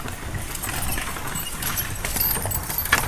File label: Horse Drawn Wagon By; Electa Quinney